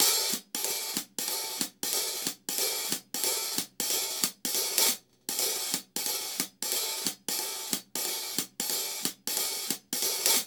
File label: music